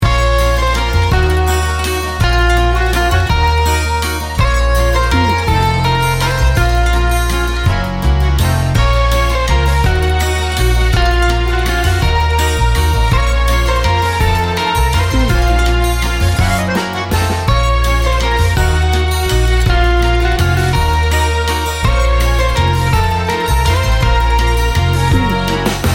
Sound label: wine and romance